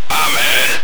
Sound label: Pain